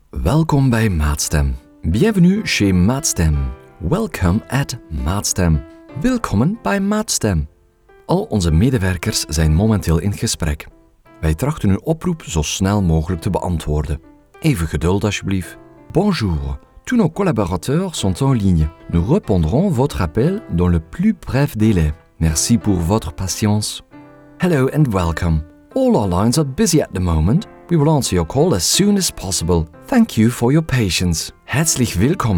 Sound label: piano; Telefonie